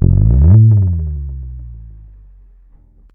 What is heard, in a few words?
Bass one shot Slide Up Down, Electric Bass; Slate Digital BASSHOLE Sample Pack